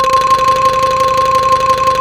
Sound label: BAMBOU C5